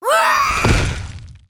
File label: devil fairy death; Akeron